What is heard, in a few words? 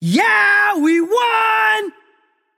nv yay; smite; xbalanque